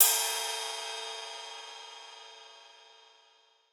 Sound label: Ride; Hats and Cymbals